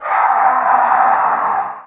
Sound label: scrm; The Elder Scrolls III - Morrowind